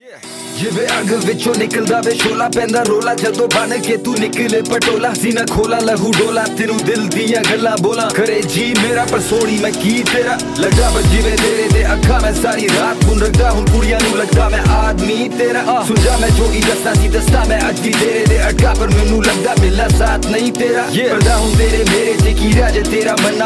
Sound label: Rap Punjabi Ringtone